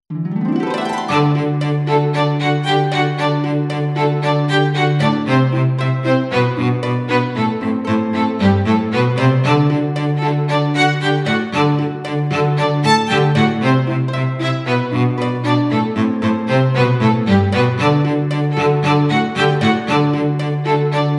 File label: Dancing Strings Instrumental; PM USA